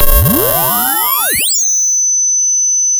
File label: sound of a bike bell